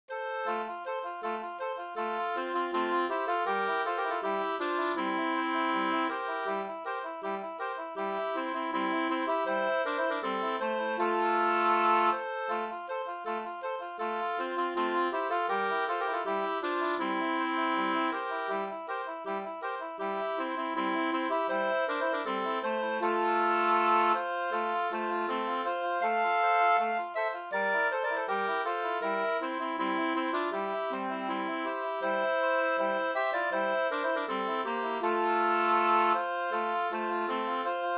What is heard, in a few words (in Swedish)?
polka polkett